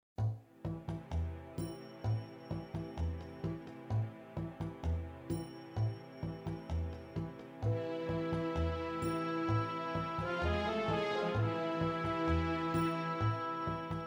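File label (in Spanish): Noches de arabia bajos; Aladín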